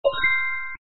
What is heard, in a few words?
audio ready